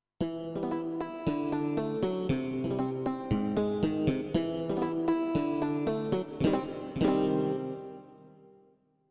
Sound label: Funk Guitar